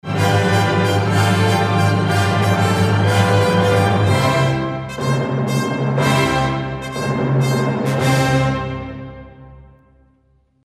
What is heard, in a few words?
Drum roll